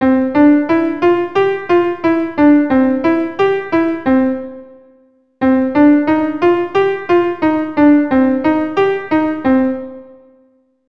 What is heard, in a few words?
RH short staccato, keyboard